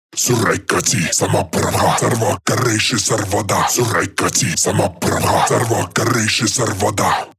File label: 138 Shamanic Voice (Dry), Psytrance